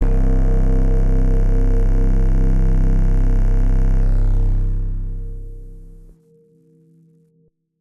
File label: Tonal Bass; 808